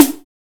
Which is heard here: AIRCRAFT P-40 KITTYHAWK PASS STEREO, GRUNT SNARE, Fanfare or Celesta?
GRUNT SNARE